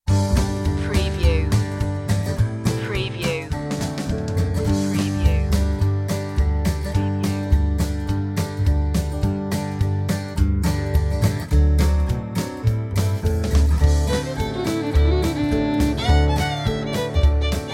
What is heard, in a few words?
slapped bass while grandma played the fiddle